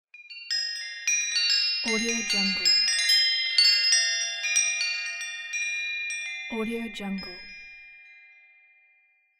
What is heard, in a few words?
chimes